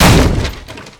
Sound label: wall wood; Golden Crayon Sounds